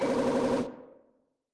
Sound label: item get; dante